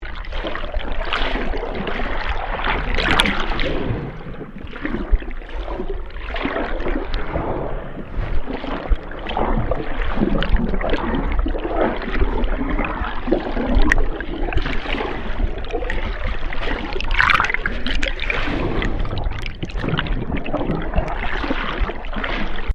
Underwater Wave Turbulence, Waves recorded underwater on Queensland's Sunshine coast.; Nature